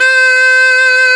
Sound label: dudy C 4; SoundBytes - Early Patches